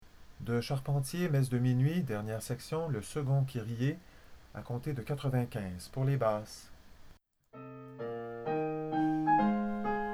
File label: messe kyrie; charp messe